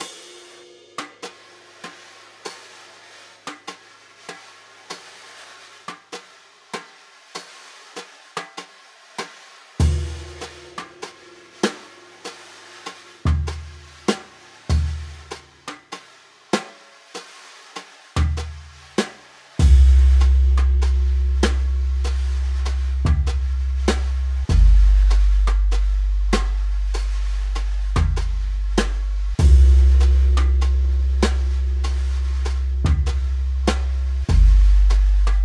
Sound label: Drums Bass - 98bpm